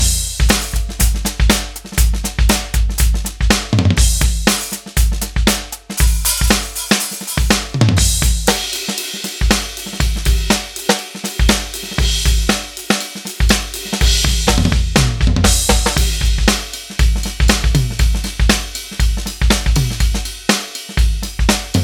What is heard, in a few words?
tone kit g major ring, tonal drums; soniccouture